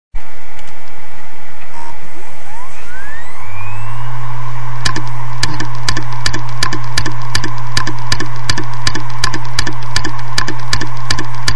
Samsung – testina corrotta, clicking, hard disk; samsung sp8002

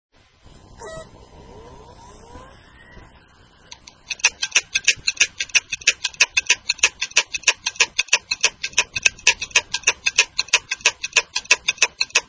Maxtor – motore bloccato, steady knocking, hard disk; maxtor 160gb

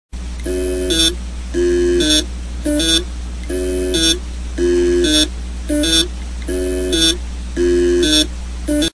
Western Digital – motore bloccato, melody, hard disk; wd

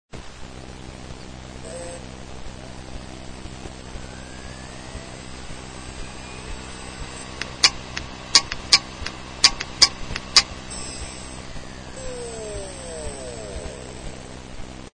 Western Digital – testina corrotta, bad preamp click stop, hard disk; wd 200gb; guasti con un grave danno fisico; Suoni di un Hard Disk danneggiato

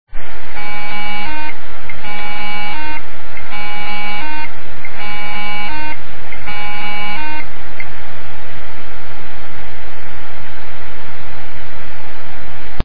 Western Digital da 2,5” – motore bloccato, laptop stuck siren, hard disk; wd

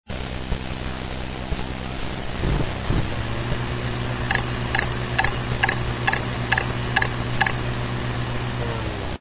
Samsung – testina corrotta, bad heads knocks, hard disk; Samsung 40gb

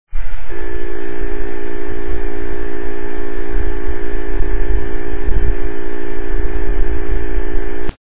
Seagate – motore bloccato, stuck spindle, hard disk

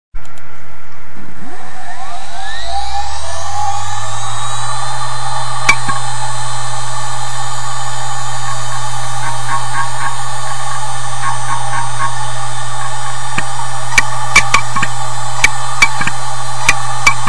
Hitachi – testina atterrata sul disco, ibm scratching then clicking, hard disk

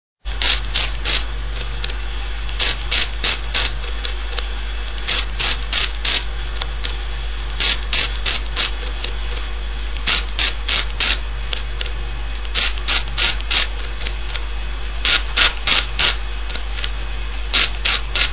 Hitachi – testina degradata, ibm scratching bad sectors, hard disk

